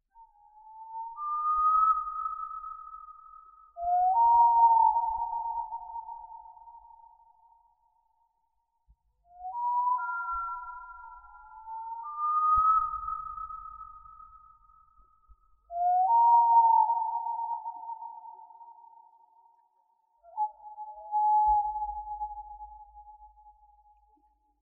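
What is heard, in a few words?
owl sounds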